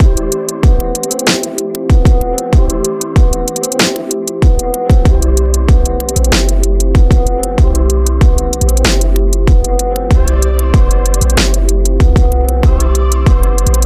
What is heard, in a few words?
trap rap; trap rap end user; あざらく